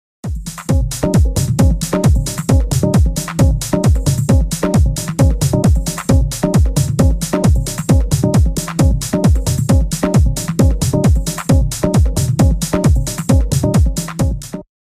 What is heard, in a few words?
one locked groove